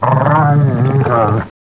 shock trooper die

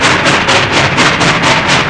train; hunger; hungerplats

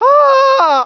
scream; svencoop; hunger; thambs

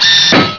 step; hunger; franklin

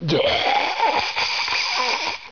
pain; svencoop; hunger; hgrunt

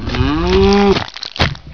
bull gore; svencoop; hunger

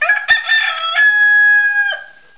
chicken, alert; svencoop; hunger

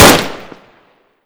m16 fire; svencoop; hunger; weapons; m16a1

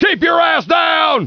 live fire; drill

